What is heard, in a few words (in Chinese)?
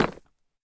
深板岩砖踩踏声